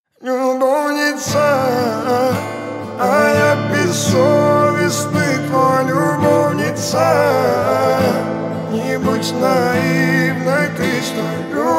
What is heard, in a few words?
acoustic version